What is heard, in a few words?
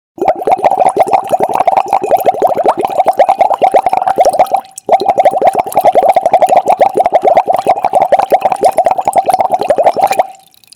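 sound of bubbling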